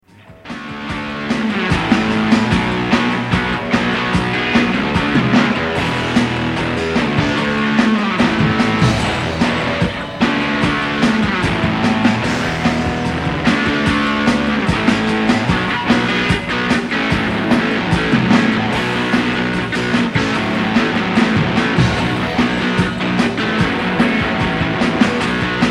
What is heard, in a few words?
rockguitar